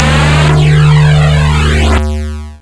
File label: Intro Whoosh